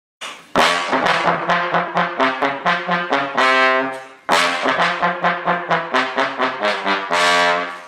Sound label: trumpet; when mom isnt home